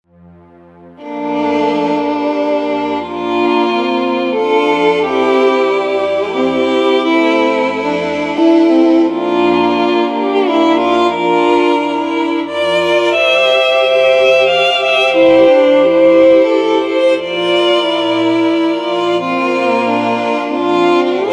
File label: violin